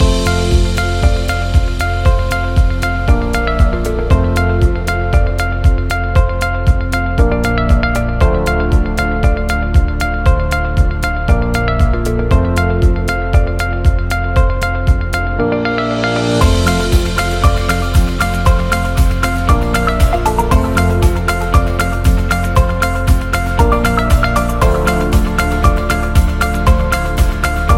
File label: Background Loop Corporate